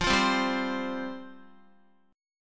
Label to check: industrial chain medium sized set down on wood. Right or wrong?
Wrong